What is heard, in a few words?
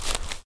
Footstep - Soft Sole On Dry Grass; Frogsong